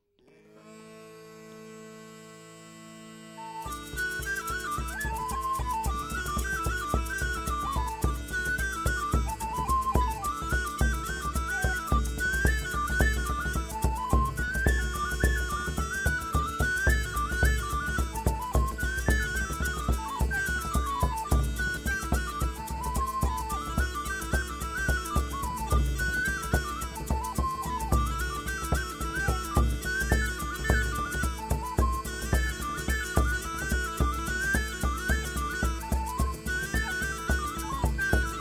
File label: celtic bagpipes scottish wedding music; braveheart